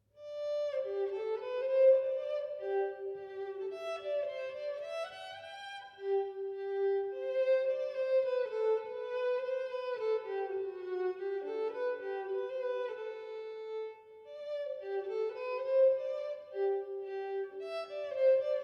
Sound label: Minuet in G, violin